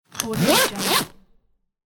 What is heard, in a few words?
backpack zipper